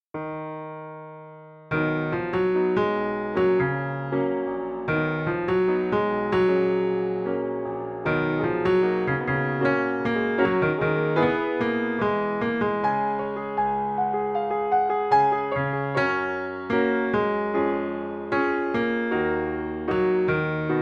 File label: FOLK Melody; Nakawa